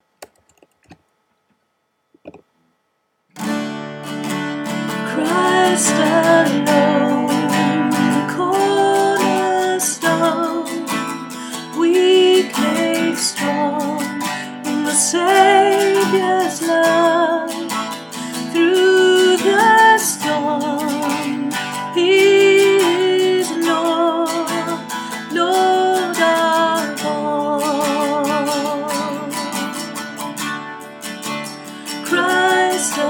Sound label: soprano